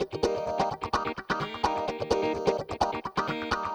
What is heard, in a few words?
Electric Guitar 128BPM D# maj; Vengeance Samples; VEH3 Electric Guitar Kit 2